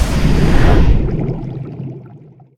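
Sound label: buoy sonar hit; Blind Drive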